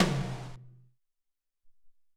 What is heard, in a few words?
SR16 Tom, Alesis SR-18